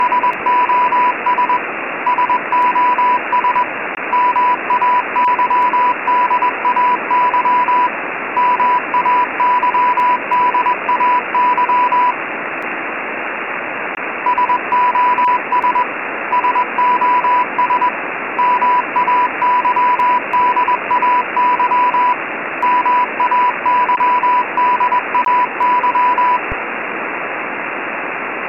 SOS Mayday 988 Hz Freq 20wpm W Heavy Background Static